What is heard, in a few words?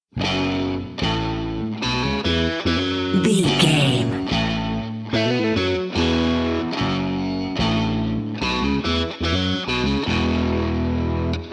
big game rock no drums